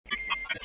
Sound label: internet sound; Zoemtonen hisses pingen woesjes tikjes